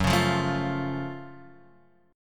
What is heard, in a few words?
Gb Minor Major 7th Gb down; strummed; JGuitar